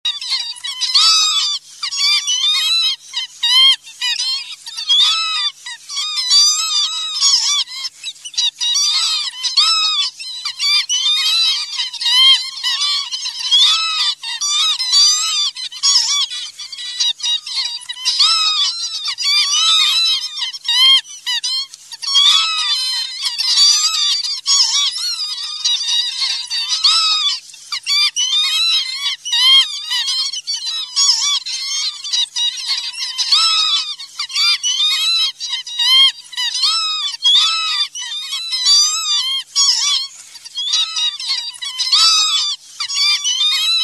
PAVONCELLA; CANTI SAG NATURE 2024

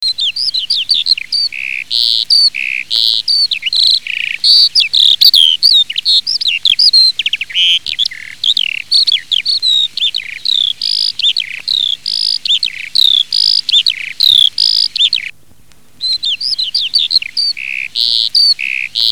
ALLODOLA; CANTI SAG NATURE 2024

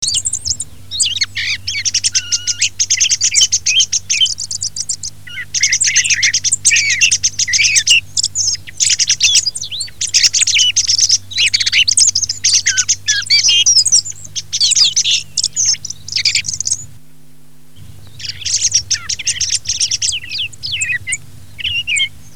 TORDO; CANTI SAG NATURE 2024

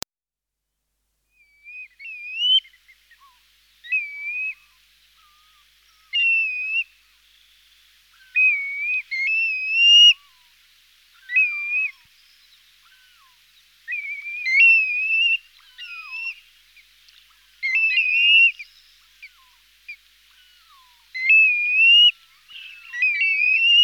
PIVIERE ARGENTATO; CANTI SAG NATURE 2024

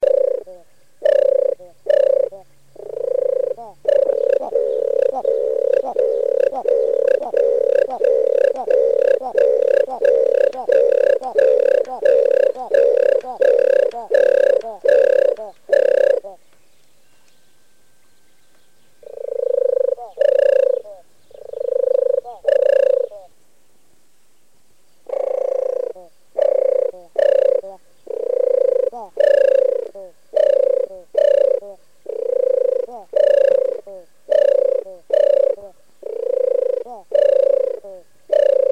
TORTORA; CANTI SAG NATURE 2024

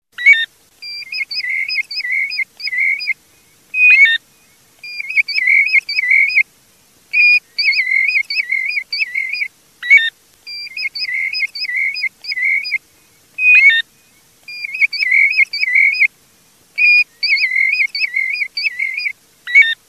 PIVIERE; CANTI SAG NATURE 2024

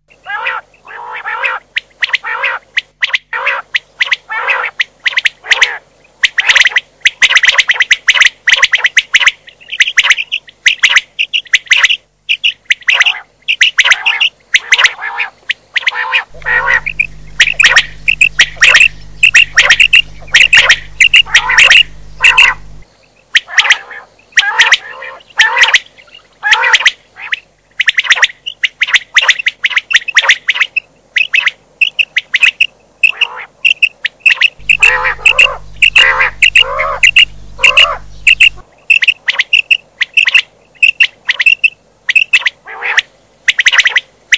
Batteria quaglia maschio; CANTI SAG NATURE 2024

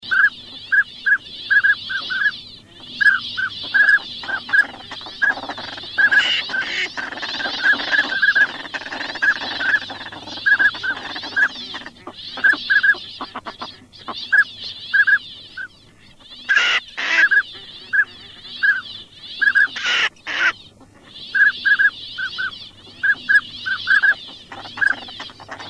CODONE; CANTI SAG NATURE 2024

